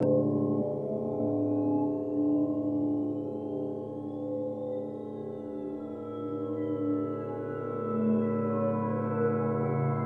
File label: LOW ORBIT, DEEP ATMOSPHERICS; Sonic Foundry (Sony Creative Software); Vir Unis Infusion